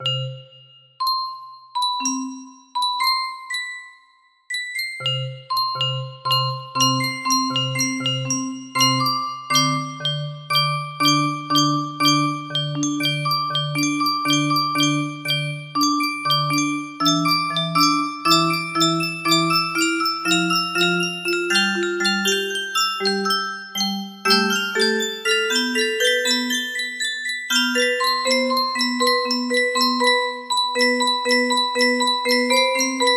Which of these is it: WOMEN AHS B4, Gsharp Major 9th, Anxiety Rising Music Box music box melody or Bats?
Anxiety Rising Music Box music box melody